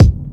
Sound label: Punchy Kick Drum Single Hit D# Key, Royality free bass drum tuned to the D# note